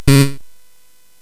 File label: SFX; Narco Police; hvsc